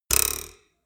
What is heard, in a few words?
ruler twang